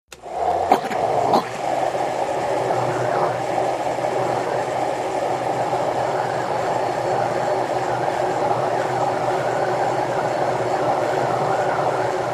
Blender Slw On Run Off, appliances

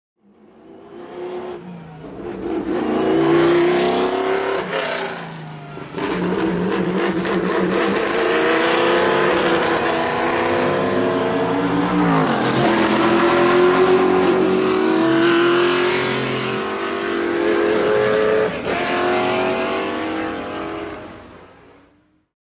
Sports Cars Away CU

